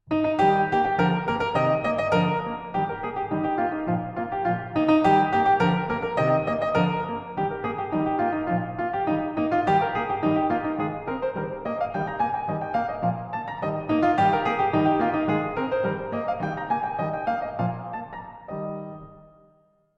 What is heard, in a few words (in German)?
Ecossaise Es Dur fur Klavier, Pianoforte